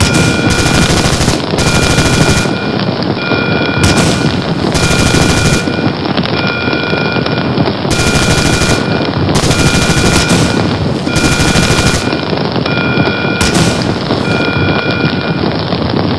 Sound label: axis bg